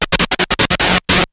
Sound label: chop, synths dancerap; The Sample Bank